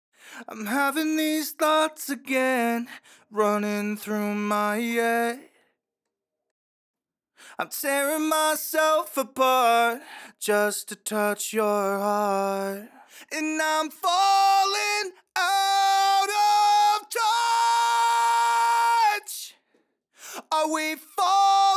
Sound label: Heavy Main Vocal Dry, Fmin 135bpm; Acapellas; Stems; Heavy Vocals 2